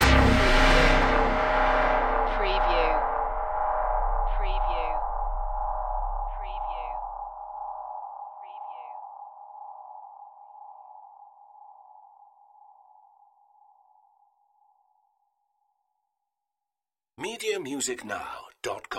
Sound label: Sci Fi Hit